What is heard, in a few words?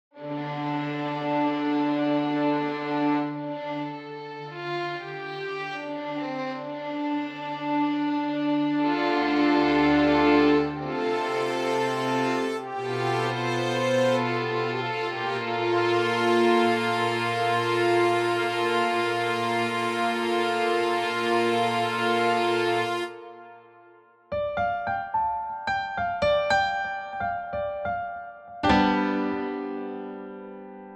cinematic